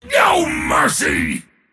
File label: attack pain; dota; mars